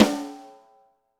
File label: Snare, Roland TD-20